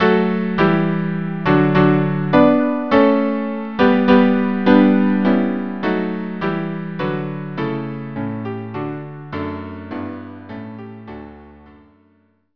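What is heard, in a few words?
Song string quartet; Peasant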